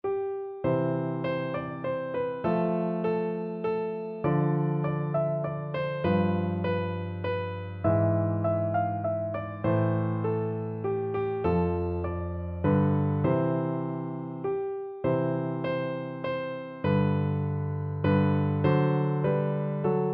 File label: we wish, piano duet